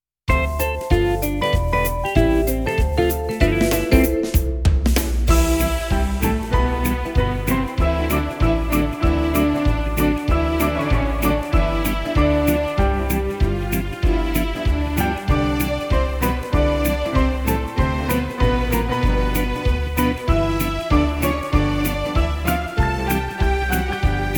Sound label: quickstep